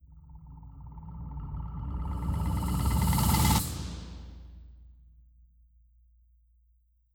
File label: Rise